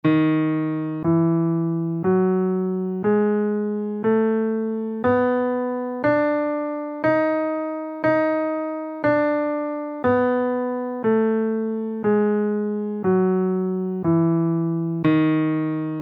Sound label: locrian natural 7 scale in Ebm